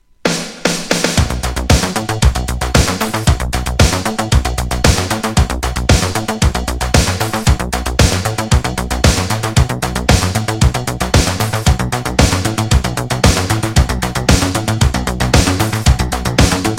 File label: automatic piano; hipnosis; zudio two